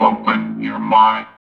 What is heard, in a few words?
VOX VOX, Electro Vox; Sound & Vision; Gigapack II CD 2 (Roland)